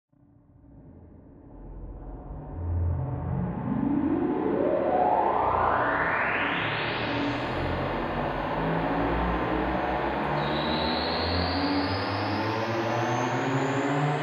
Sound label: Rises; neuro; Optiv & BTK